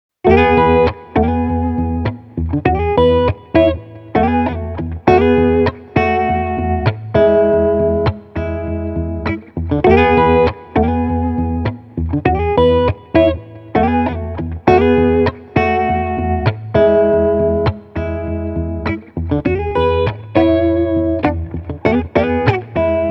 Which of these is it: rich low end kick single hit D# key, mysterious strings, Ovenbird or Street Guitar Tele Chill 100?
Street Guitar Tele Chill 100